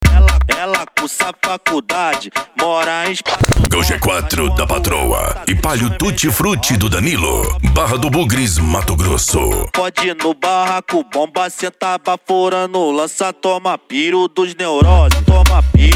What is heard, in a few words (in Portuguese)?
funk mega bass e flash back